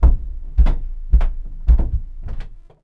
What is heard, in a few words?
footsteps; BedRoom